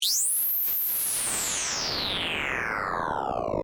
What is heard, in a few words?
Radio Filter Sweep, Scifi, Communications; Science, Fiction